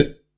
Minimal Perc; Minimal Percussion

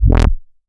Synth Bass; Vengeance Samples; VEE Synth Shots

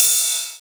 Cymbals Ride; Vengeance Samples

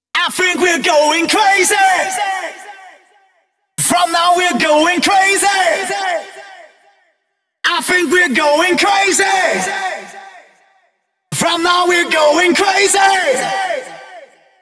Male Vocals WET, 128 BPM; Vengeance Samples; Vocal-Hook Kits; Song Kit

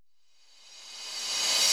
139BPM Short FX; Vengeance Samples; Brandmark Kit; Sound FX

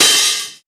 Open Hihat, Cymbals; Vengeance Samples

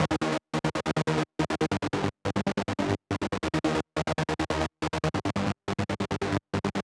Bass Arp DRY 140BPM, G minor; Bassline; Vengeance Samples

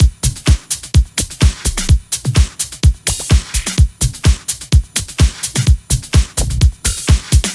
127BPM Kit; Vengeance Samples; VFH2 127BPM The Mission Kit

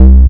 Bass C, Offbeat Bass

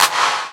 Clap; Vengeance Samples

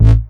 Synth Shots; Vengeance Samples

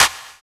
Claps; Vengeance Samples

